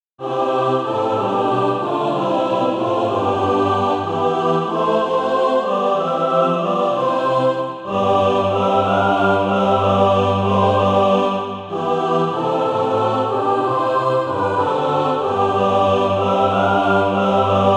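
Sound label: mixed, Practise Music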